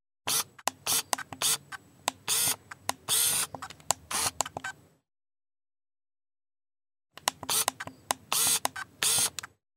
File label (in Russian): serija zumov avtomaticheskoj kamery